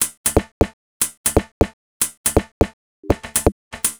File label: Pink Hats Beat, Electronic Beats; Sonic Foundry (Sony Creative Software); Myths of Technology